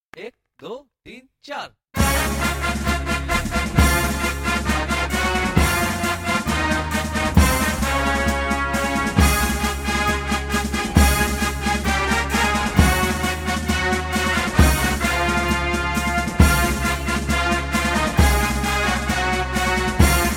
instrumental

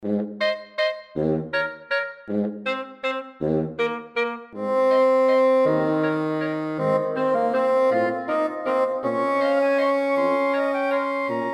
quintet

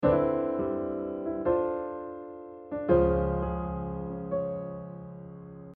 common-tone modulation

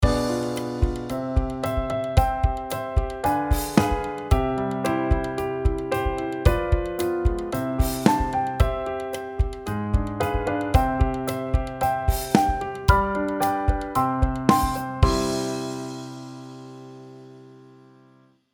dim chords to tonic

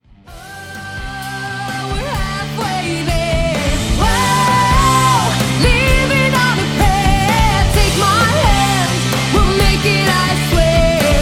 super bass

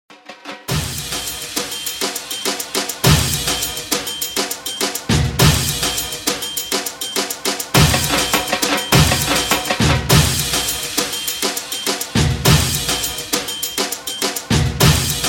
SAMBA

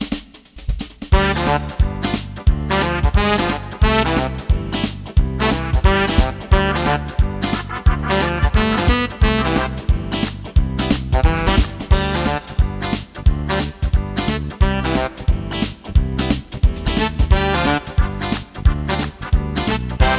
MAMBO HIT

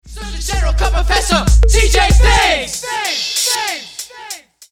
Vocals

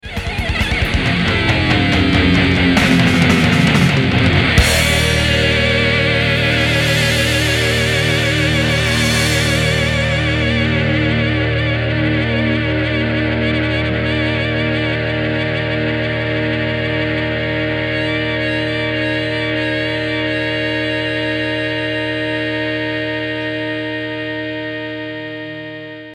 Guitar

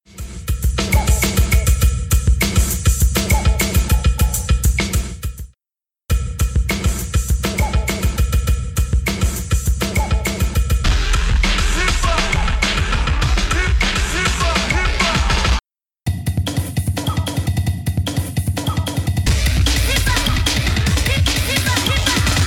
Beat

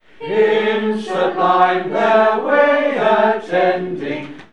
Hymns; Beechwood

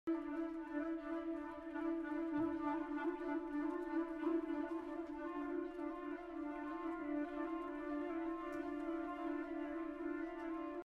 Clocks And Clouds; Ligeti

